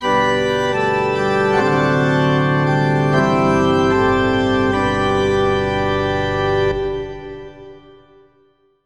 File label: save game